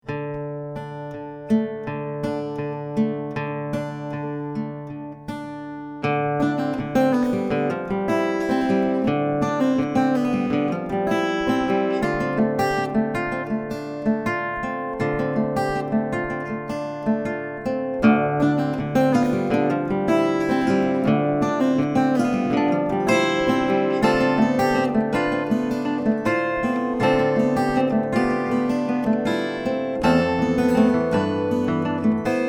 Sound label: Acoustic Guitar Song; Deep Frets